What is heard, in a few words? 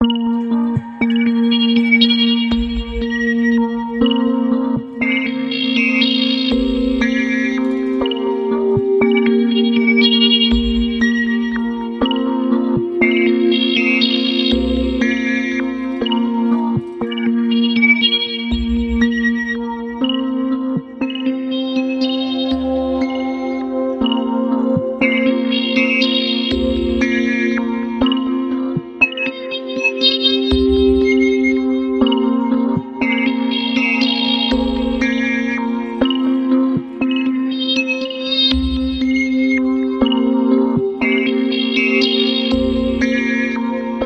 suspended animation